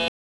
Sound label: Halt, train; OpenBVE